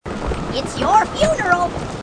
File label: grunt; h3dialogue; grunts